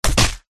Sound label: human punch smack double crunch; zapsplat